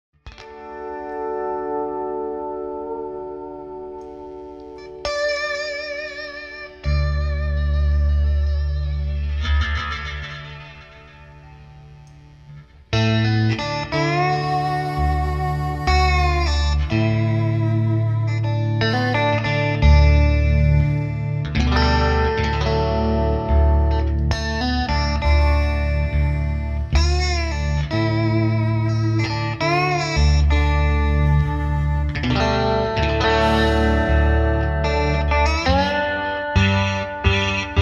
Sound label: Hyhm In D, Fender Stratocaster